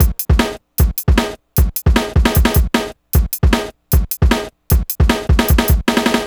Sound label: drums, Hardcore Breakbeat, 153 Bmin; Big Fish Audio; Hardcore Breakbeat Action